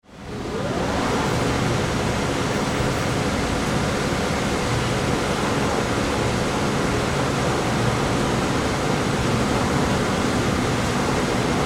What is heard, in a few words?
Ambience Factory Heavy Activity Industry; Factories